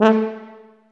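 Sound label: brass tuba C, staccato; Solo Brass; Roland LCDP12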